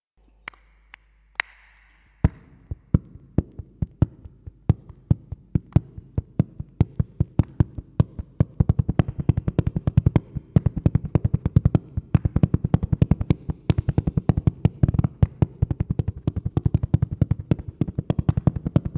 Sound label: Naad (Body Drumming)